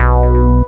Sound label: Synth Shots; Vengeance Samples